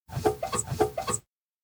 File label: glass polish; anemoi